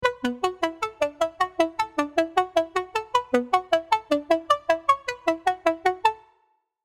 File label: Bbm 170, Future Bass & Melodic Trap MIDI Melody; Twinkle